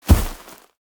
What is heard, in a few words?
bear step; chaosbear